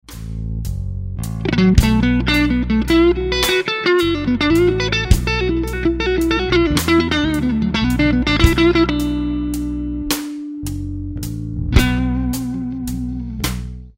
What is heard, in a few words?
Lick, guitar; Slow Minor Lick 3; Minor Blues licks